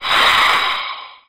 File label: charge, lost soul; quakemapping; mod xj18